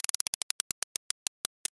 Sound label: roulette